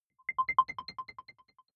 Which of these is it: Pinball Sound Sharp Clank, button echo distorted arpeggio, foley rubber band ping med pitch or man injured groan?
button echo distorted arpeggio